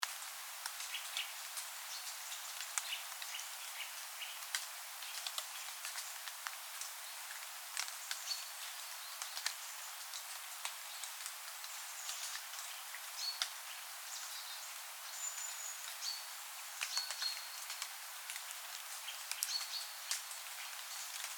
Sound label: fog drops